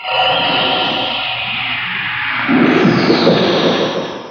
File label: Ancient Noise FX, Industrial